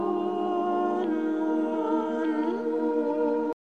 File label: ambiance